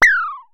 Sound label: Synare Filter; Star Instruments Synare 3